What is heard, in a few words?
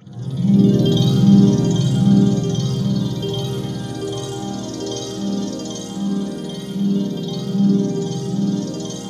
ATMOPAD; Best Service; XX Large; Pads